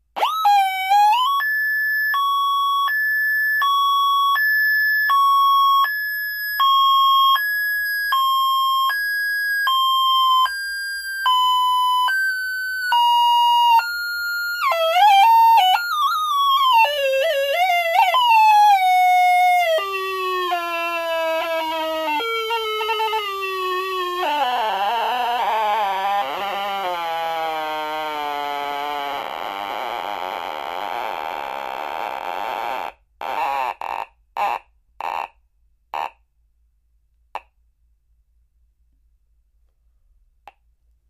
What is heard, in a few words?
Alarm Car Type 4; Modulating Hi Low Tone Slows Down And Speeds Up, Alarm Slows Down And Starts Again 2x